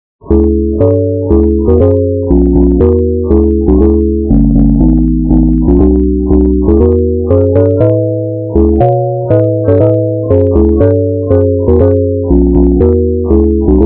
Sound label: bottle; USSR